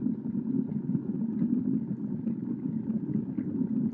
cooking

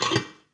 mixer bowl add

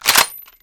pull; r700

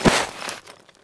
ready throw, grenade

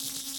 panel electrical wire

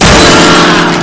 piano crash

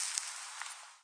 joint stop

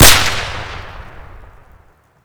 fire, r700

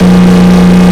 v12 russian, engines; acf engines

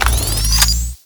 dragon charging

